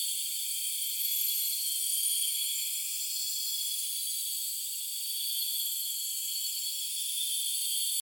Retrieve; Boomerang Fu

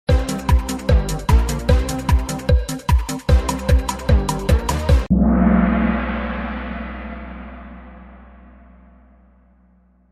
In Game Music (5 Second Count Down); Kahoot

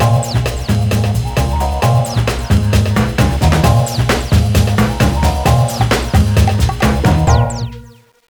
Music Golf

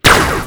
SAW

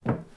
DRAWER CLOSED; don t be afraid the first toy

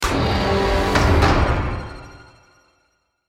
Stinger Action Medium

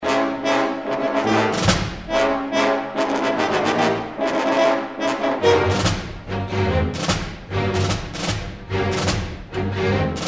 Loading Screen, Battle Pending

